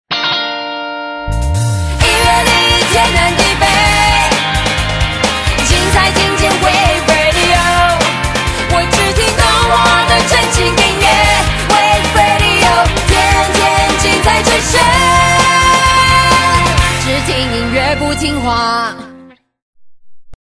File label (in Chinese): Rock版